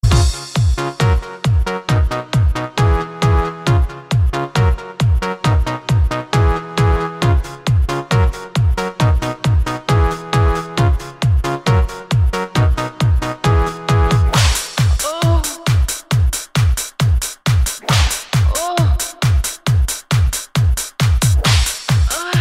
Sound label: trance theme